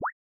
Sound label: appear online; interface sounds; p0ss